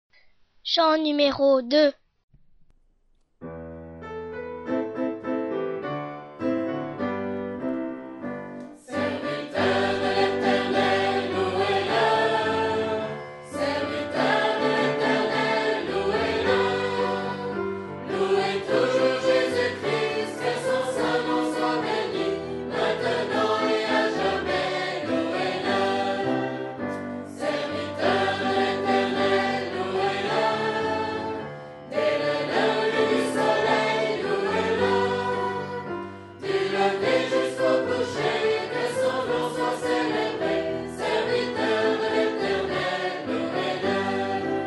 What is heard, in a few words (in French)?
Chant; FR